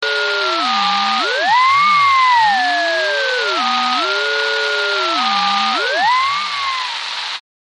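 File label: radio; rajio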